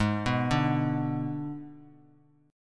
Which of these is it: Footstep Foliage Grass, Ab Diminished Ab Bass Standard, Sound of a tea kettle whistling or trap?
Ab Diminished Ab Bass Standard